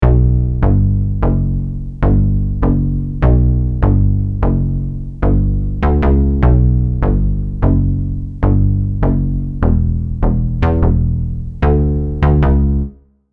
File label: Scatter, Bm, 150bpm, Basslines